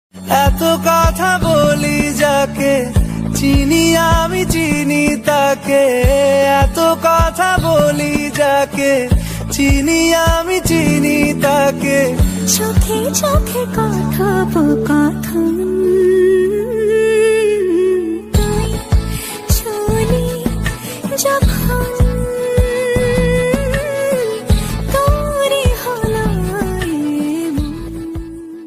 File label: bengali ringtone